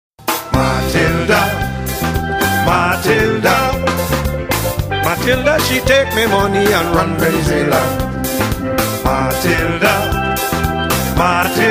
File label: Caribbean Steelband